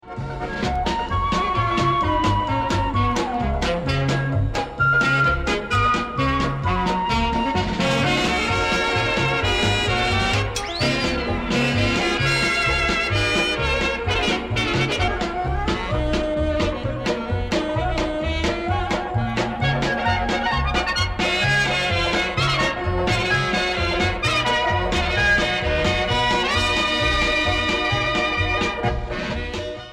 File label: Polish Polkas, Polka; Ethnic Music Compilations